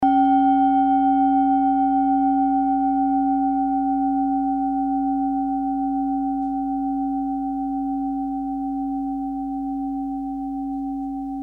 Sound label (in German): klangschale set; Klangschale Nr.5; Gewicht = 660g; Durchmesser = 14,5cm; Höhe = 7,8cm